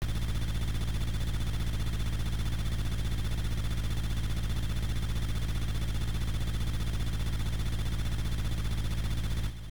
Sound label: Helicopter; Hyper Somen; MSX2; SCC